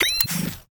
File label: Menu Weapon Disassemble; Custom UI Sounds; Call of Duty Modern Warfare 2 (Campaign Remaster)